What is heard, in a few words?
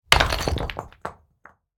Impact Rock Debris; Frogsong